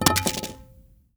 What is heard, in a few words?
Arrow Fall Ground; timelock vr